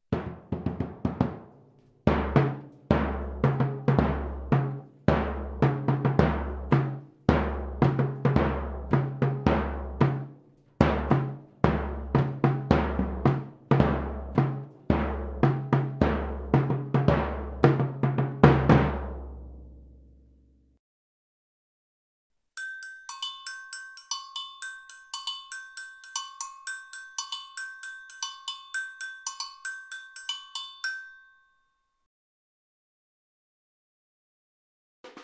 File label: Samba Instruments